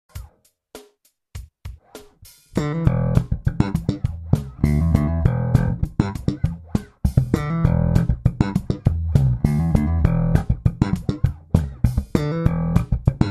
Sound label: Slap line w DT in E